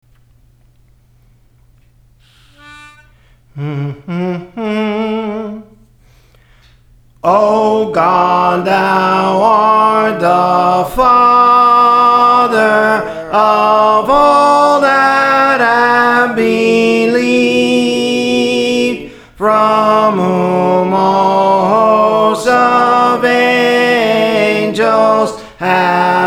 Soprano, Songs; Praise For The Lord Training

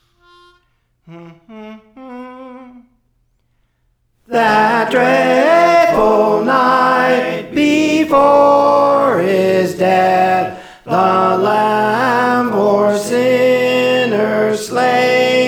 Obligato; That Dreadful Night; Praise For The Lord Training

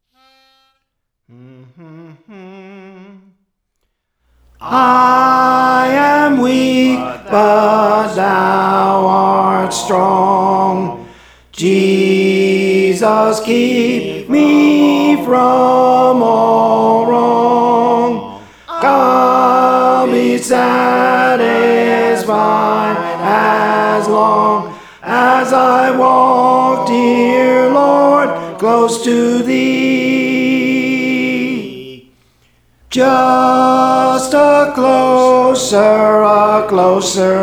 Tenor; Praise For The Lord Training; Just a Closer Walk with Thee

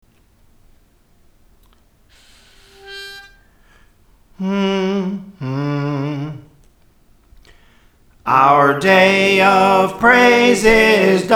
Soprano, Songs; Our Day of Praise Is Done; Praise For The Lord Training

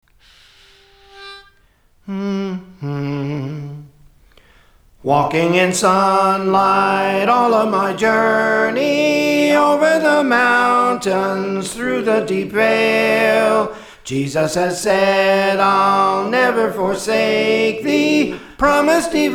Soprano; Walking in Sunlight; Praise For The Lord Training

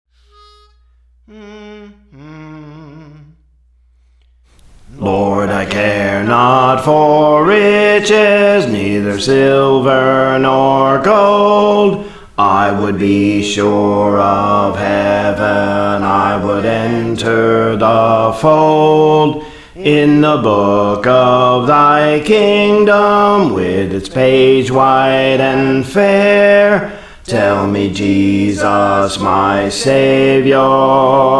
Bass, Songs; Praise For The Lord Training; Lord I Care Not for Riches

